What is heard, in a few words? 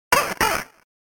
cryings